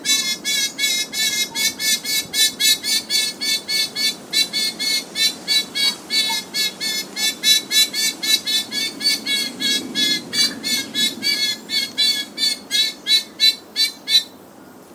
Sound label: bellbird korimako alarm call